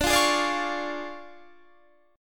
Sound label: D Minor Major 7th Flat 5th, D, Guitar Standard; DmM7b5 strummed; JGuitar